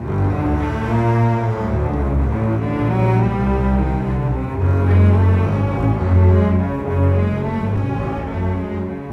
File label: Bass Cello, Cinematic; Sonic Foundry (Sony Creative Software); Orchestral Series VOL-3 - Cinematic